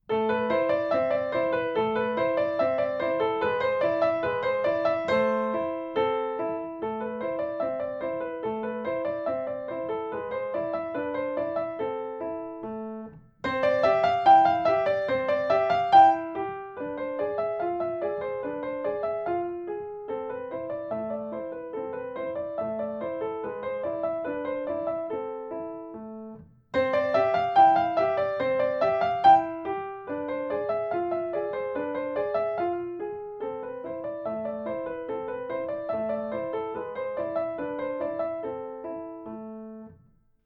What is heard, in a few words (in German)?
Klavierstück